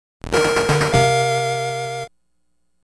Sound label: GAME OVER (Game Over); the king of fighters r-1 r-2